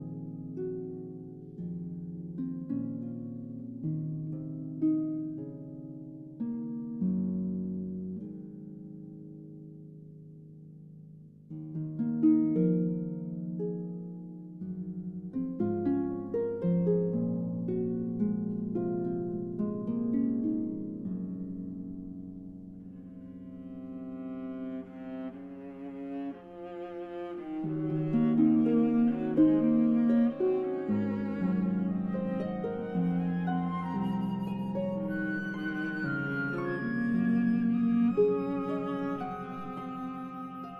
Harp Concerto Lullaby

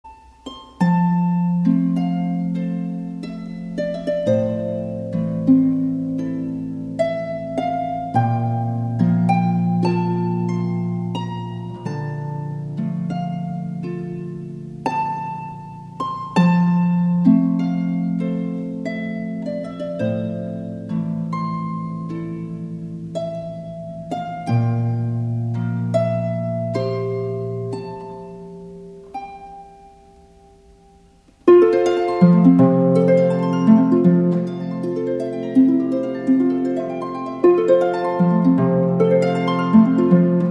Harp Sounds- 3 Christmas carols; Hiller, Wilfried

